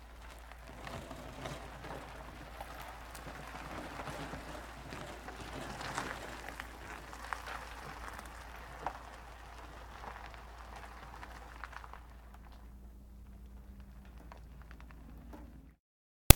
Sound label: Skids Tires Dirt Gravel; Blind Drive